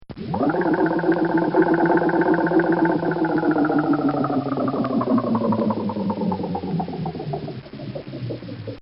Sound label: Pulse Motor; Motors; Machines; Bobby Cole